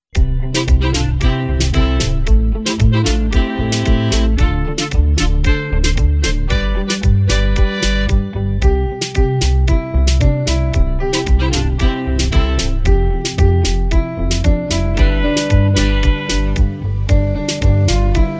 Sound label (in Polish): urodziny melodia zwolnione tempo